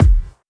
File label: Kick, Drum Hits